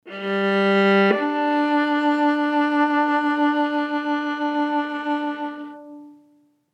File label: legato real; A simple yet effective method for more natural legato sampling